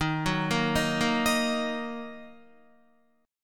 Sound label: Eb Minor Guitar; Ebm arpeggiated; JGuitar